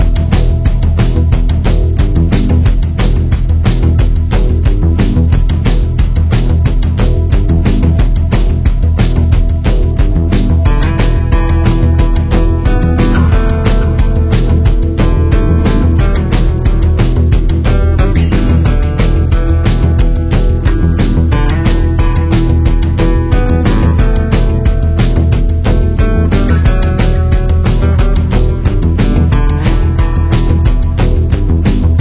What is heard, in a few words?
Ambient Loop; Barter Town